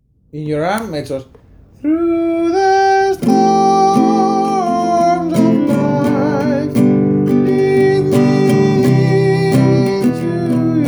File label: In your arms Mezzos